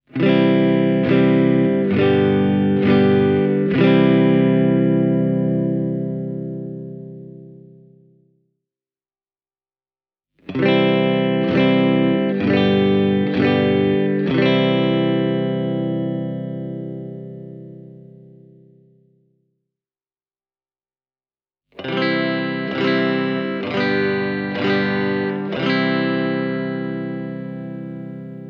dual tone style clean; rautia guitars

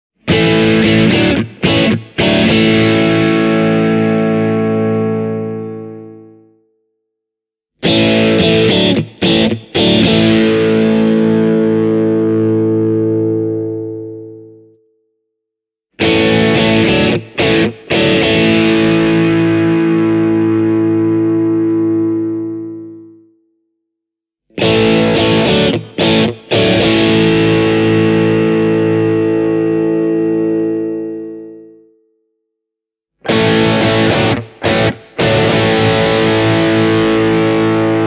tokai tst 50 fs 3 tone sunburst dirty

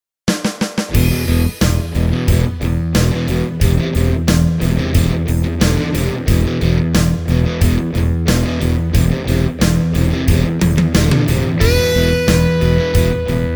brunetti singleman 16; demo track

